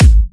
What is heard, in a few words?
Kicks; Vengeance Samples